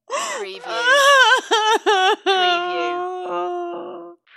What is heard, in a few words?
Female Sob